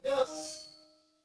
feedback success

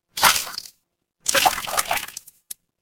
water splash

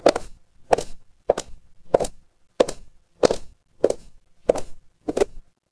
footsteps heels

